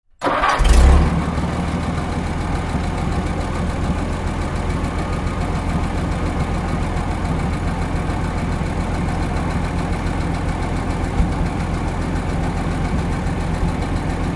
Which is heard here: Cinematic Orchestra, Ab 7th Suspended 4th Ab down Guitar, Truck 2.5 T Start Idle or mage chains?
Truck 2.5 T Start Idle